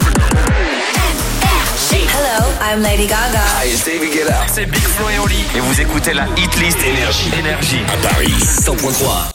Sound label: Jingle; PARIS; NRJ